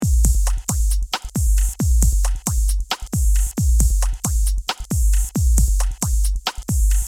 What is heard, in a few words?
drum fills